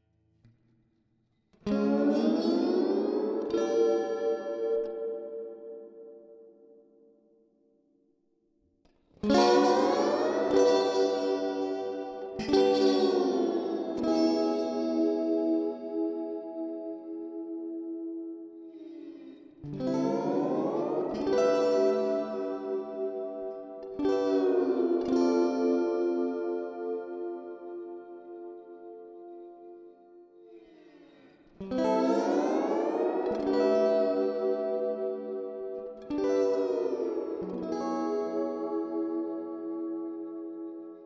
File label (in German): Vermona ReTubeVerb E gitarre direkt